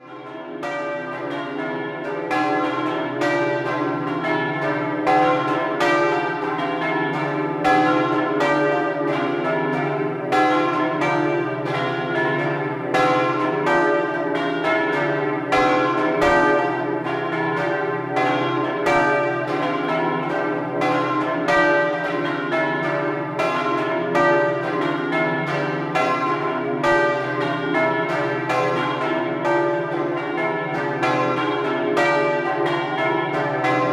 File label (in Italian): Azzano Decimo Plenum, campane